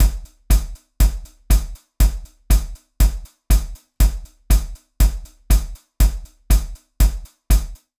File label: 120 Marching Dub